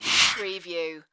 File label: futuristic door slide